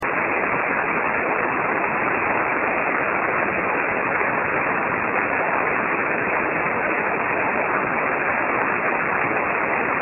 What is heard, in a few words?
Weird Noises; Sasquatch